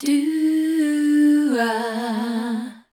MELODIC #D, Voice

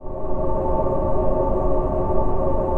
PAD, ATMO PADS; Best Service; XX Large

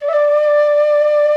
FLUTE

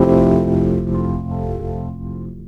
RHODES; E-Lab; X Static Goldmine 2

